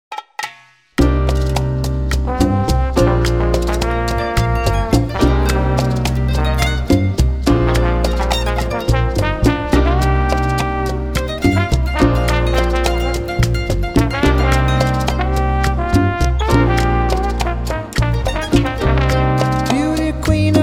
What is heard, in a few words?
Ballroom Rumba, 24-27 bpm